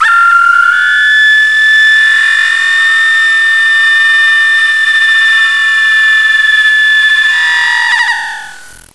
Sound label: Scream